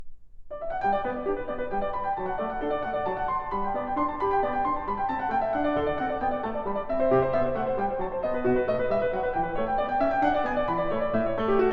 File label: Partita No. 5 in G Major, Corrente; BWV 829; Bach; Partitas, BWV 825-830; Igor Levit